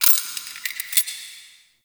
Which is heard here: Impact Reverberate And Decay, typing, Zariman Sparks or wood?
Zariman Sparks